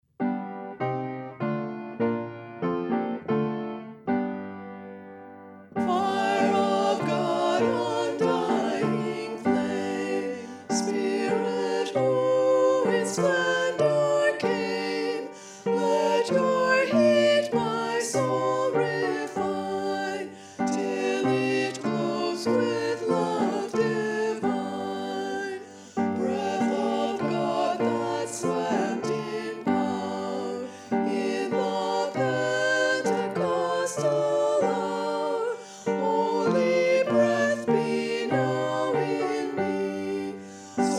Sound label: hymn